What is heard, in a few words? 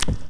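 slap of a palm on a puddle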